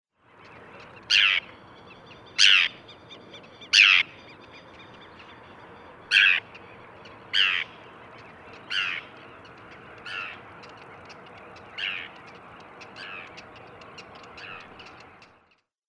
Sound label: forster s tern; paul marvin